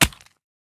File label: pistol drop gravel; STALKER; IWP